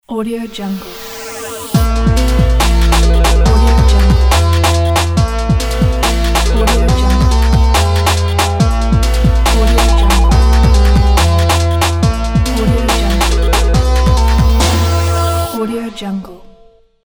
dubstep logo

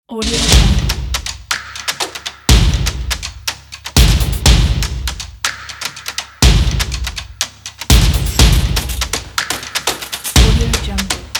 stomp

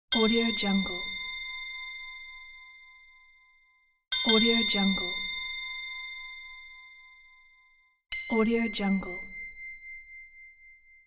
crystal wineglass hits

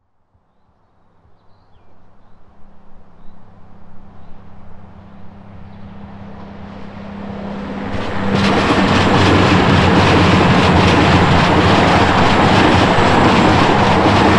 goods train passing

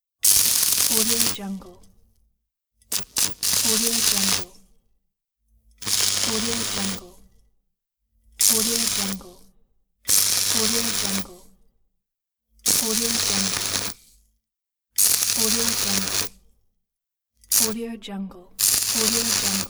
welding machine

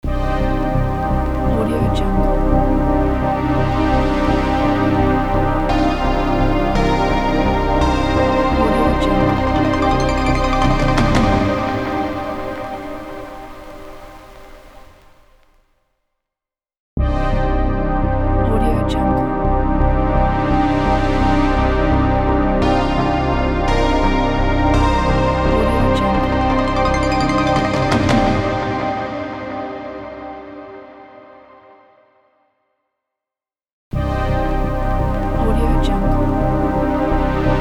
vhs logo

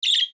budgie single chirp; sound ideas

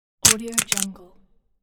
bone breaking

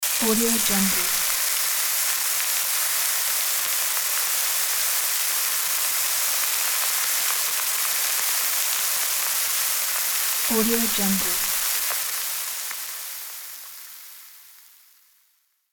frying

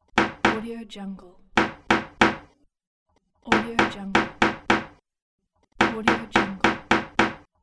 hammering

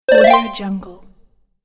retro game upgrade

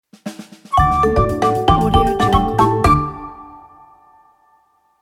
marimba short logo

